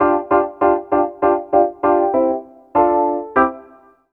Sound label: KEYB R, Roots Funk; USB Soundscan vol.41 - Roots Funk; AKAI